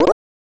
Score Calculation

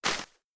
Stone; Blockman Go; Android

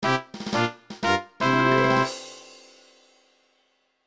Award Fanfare; Farm Frenzy 1 Java

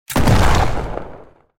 handcannon shot; Out of Reach: Treasure Royale

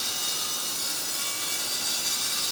white palace saw loop; Hollow Knight

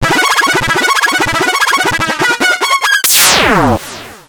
jackpot

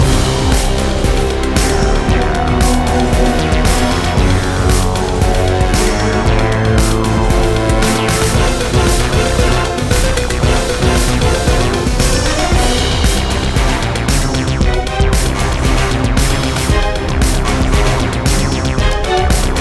Forest Boss; Adventures of Pip

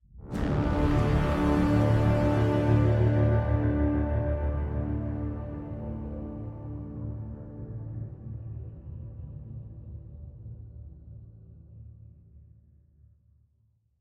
End 190bpm; Legends of Pegasus; windows gamerip 2012

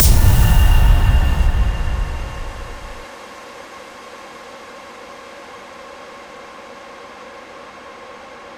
Menu Spawn Reverse

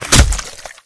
Character Body Impacts; unreal development kit

